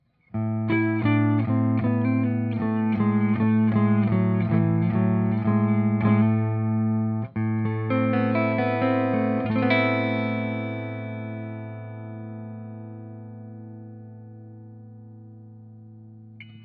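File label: Neck HB clean, guitar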